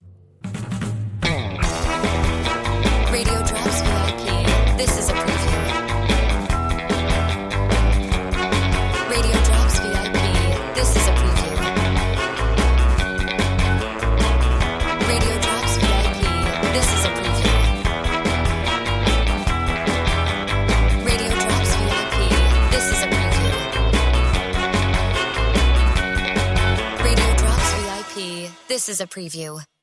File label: Music Bed